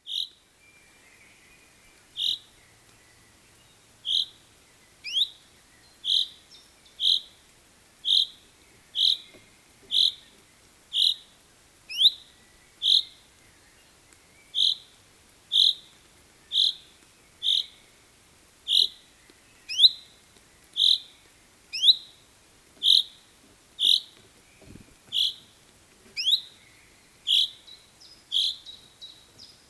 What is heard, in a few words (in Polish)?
glosy ptaków zięba rain call; avesnature